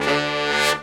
BRS SHRT, R&R Horns, Sect; Roland L-CDX-03

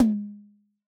Yamaha DJX 2B, Jazz Tom

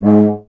tuba; Miroslav Vitous - Solo Instruments VOL-2

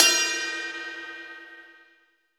Folk Ride Bell, Cymbals; Roland BK-5

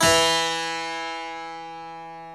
Harpsichord; E-MU Producer Series Vol. 2 – More Studio Essentials; Keyboards

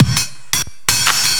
loop, Drum & Bass, 170 BPM

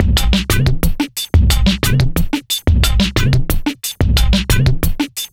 squarespit 090bpm, Downbeat Dance Grooves; Zero-G

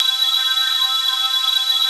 D.PIANO HP63; Analogon

